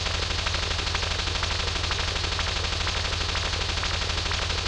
Hover Car; Cars; Megacity

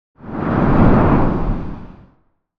table appear, Hologram; SpaceshipDemo

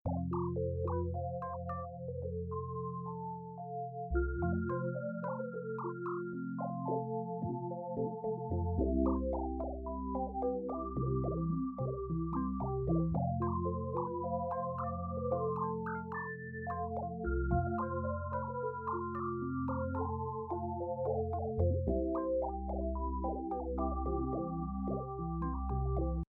Test Song Retro

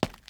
Concrete, Footsteps

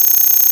data process

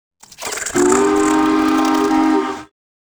Choo Choo

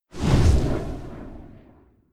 Fly By beefed low; Megacity

